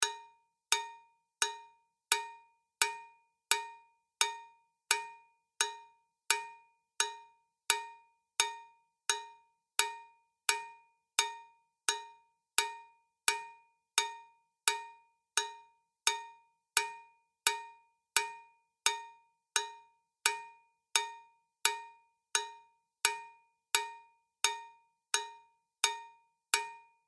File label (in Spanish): CLAQUETA; ADIOSES SHOW CHOIR; THE CASSETTE EXPERIENCE